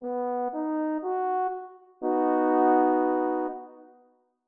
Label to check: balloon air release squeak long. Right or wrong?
Wrong